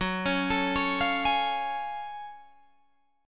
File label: F sharp Minor Major 9th F sharp Guitar Drop D